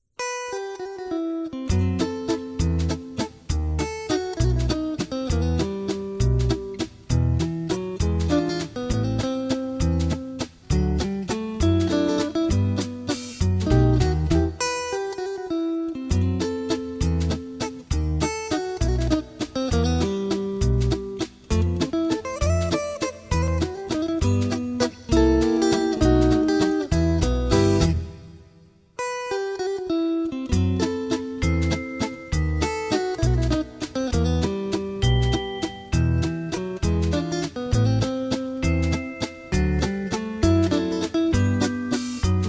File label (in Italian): dolce chitarra